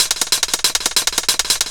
Fill, Drums, Eclectic Electronica

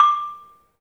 MARIMBA, D; 3D Sound; 3D Keys VOL-1

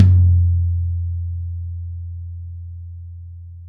DRY KIT, Drums; Real Mega Drums

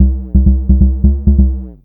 Bassline; Drums & Drones

